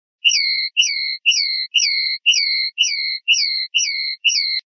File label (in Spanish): rep sonajas